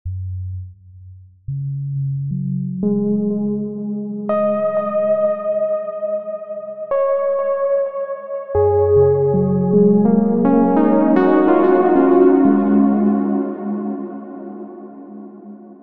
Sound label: Pad; PatchFactory